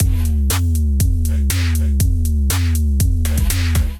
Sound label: mega vox kit, drums; Ableton; Build and Drop